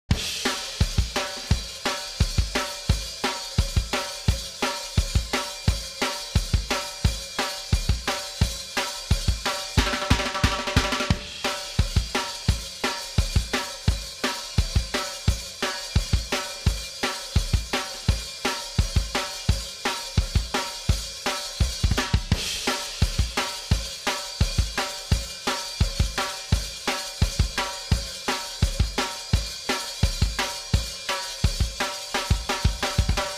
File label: drums mix